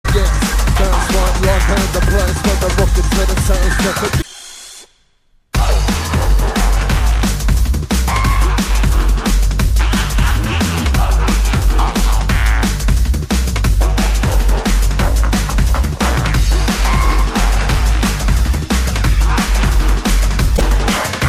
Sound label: Drum and Bass Jungle, UK Bass Radio